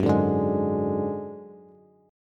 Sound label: Gb Suspended 2nd Sharp 5th Gb, down, Bass; strummed